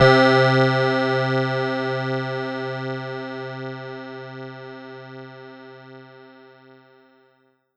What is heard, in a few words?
Kawai K3 Modulated Piano C3